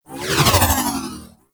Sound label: Ball Projectile By